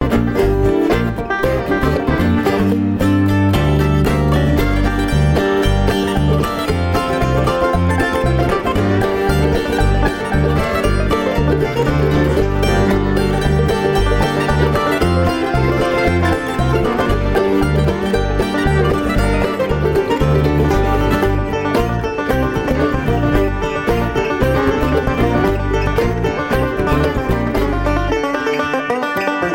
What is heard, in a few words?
Kentucky Mandolin